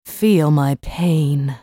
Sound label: attack; FallenAngel